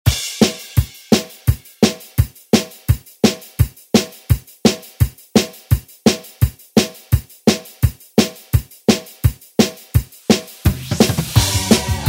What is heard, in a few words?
80s Re Drum; DJ Mhark